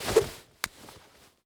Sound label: spear deploy; Rust